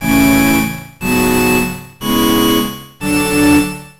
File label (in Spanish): sample bandoneon con pulmones fast